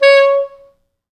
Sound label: C#4, Pop Brass; Best Service ProSamples vol.19; AKAI